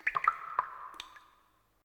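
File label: ambience water drip; yo frankie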